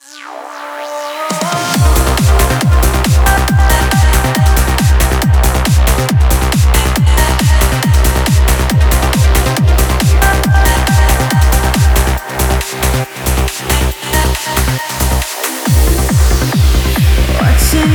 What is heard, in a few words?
raindrops uplifting mix, trance